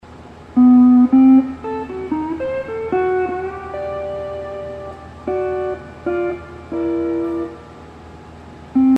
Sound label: hawaiian piece